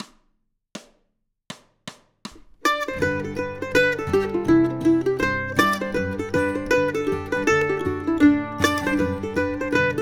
hoedown

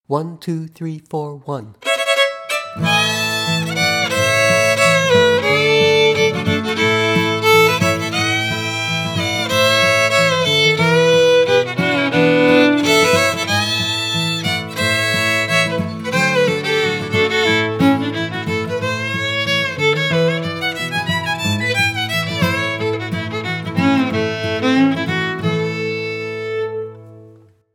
Fiddle C Foggy Mountain Top; Jack Tuttle’s Bluegrass Fiddle Collection; (2) harder version, my arrangement, A

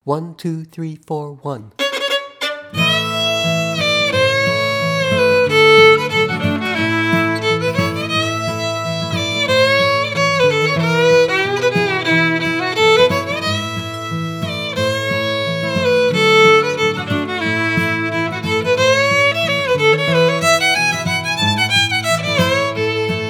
Fiddle C Foggy Mountain Top; Jack Tuttle’s Bluegrass Fiddle Collection

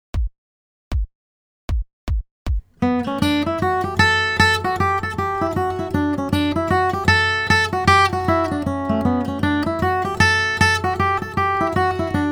Guitar; Forked Deer; Jack Tuttle’s Bluegrass Guitar Primer; cool popular fiddle tune out of C

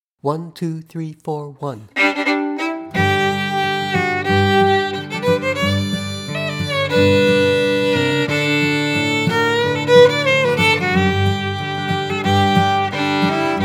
Fiddle; Jack Tuttle’s Bluegrass Fiddle Collection; (1) - my version G

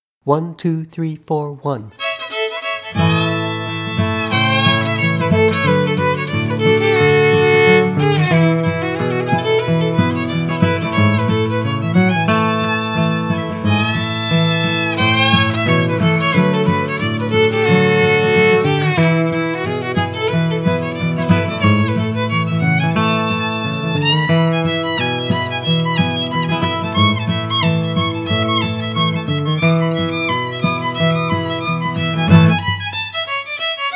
Fiddle; Jack Tuttle’s Bluegrass Fiddle Collection; tune, my arrangement, A

